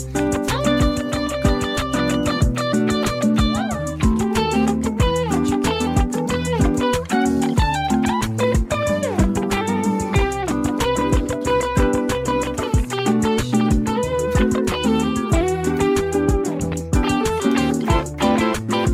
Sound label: anime chill music remix